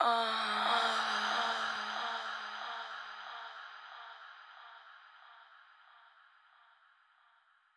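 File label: Soothsayer Kit 138 BPM Vocal FX; Sound FX; Bminor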